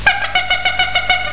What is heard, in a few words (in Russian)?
truba; Maratonci trce pocasni krug